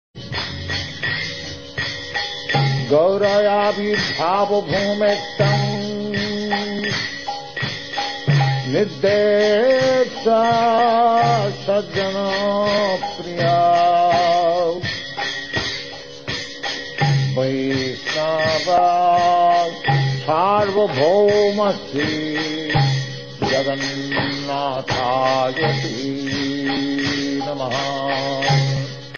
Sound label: Jagannatha Pranati Sung; Srila Prabhupada